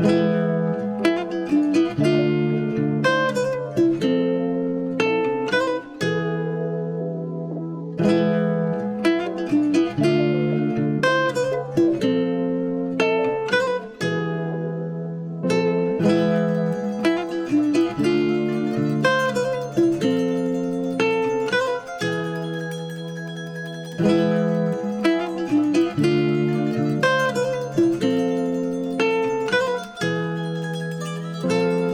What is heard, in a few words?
Wild West, 120 BPM C Maj, Guitar Loops; Cymatics; Journey Guitar Loops